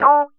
GUI MULT; EastWest; Dance Mega Hip Hop Electro

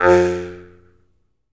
jaw harp, Pitched Percussn, Jaw Harps; E-MU Producer Series Vol. 7 – Old World Instruments

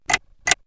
Tick Tick, perc fx; X-Static Goldmine

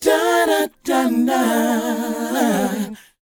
DOWOP F; ILIO; Vocal Planet

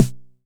909 SD; Goldbaby Tape808 & Tape909; The Tape 909

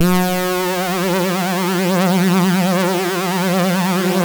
Synth and Organ; InVision Interactive; Keith Emerson; The Most Dangerous Synth and Organ

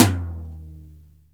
TOM CROSS, Real Toms, Dry Toms; Roland L-CD701

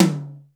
DRY T, drum; Crypton; DrumBase5000

